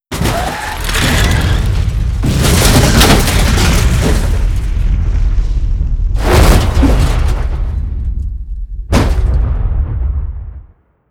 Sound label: Vehicle Impact Severe, Crashes; Vehicles